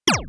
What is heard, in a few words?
Pulse gun